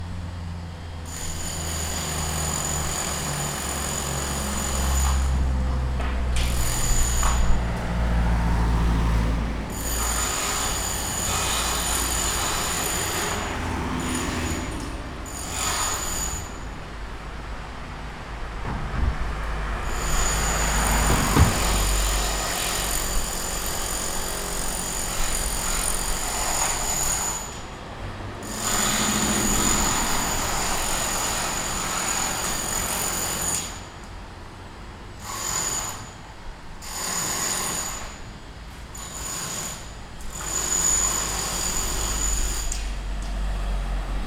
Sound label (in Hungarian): epitkezes autoforgalom